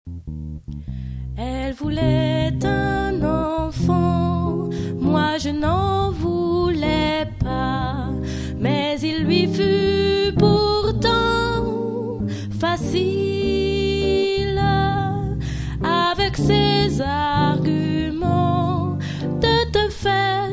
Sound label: Soprano